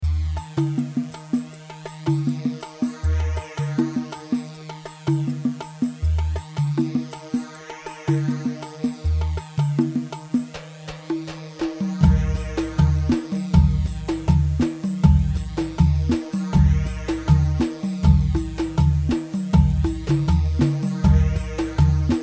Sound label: Harbi 4/4 160, khaleeji